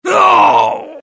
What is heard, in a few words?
death; mohaa; dialogue